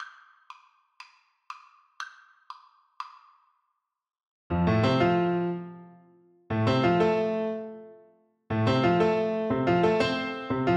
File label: wedding march, Tuba